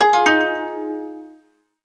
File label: Gayageum, notifications; LG G3 Screen F490L